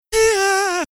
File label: FutureBass Melo Vo Chop Reverse